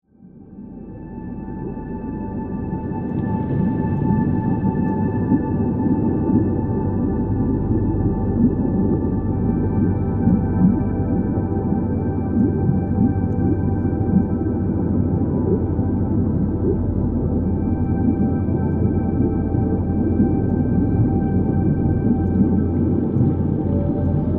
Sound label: Ocean Echoes Whale s Legacy; Innoceana